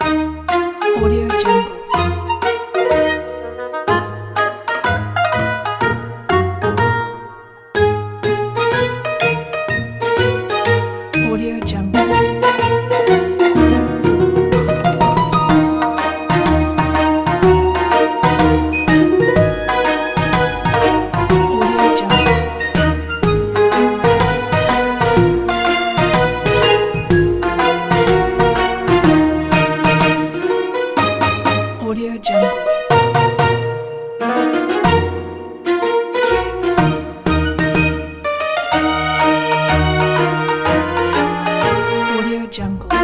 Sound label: japanese cartoon cute